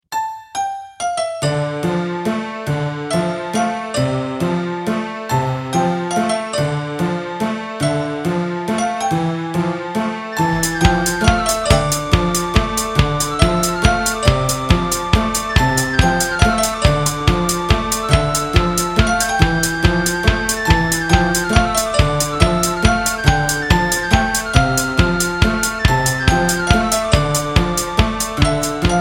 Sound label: Ocarina